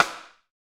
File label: SD rim; Sound & Vision - Gigapack I